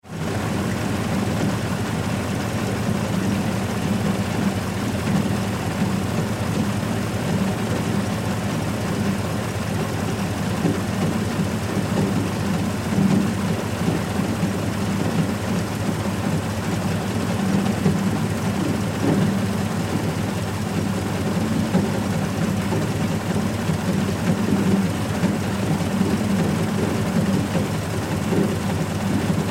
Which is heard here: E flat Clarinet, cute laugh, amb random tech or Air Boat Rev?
Air Boat Rev